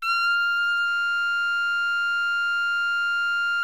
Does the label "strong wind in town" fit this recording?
No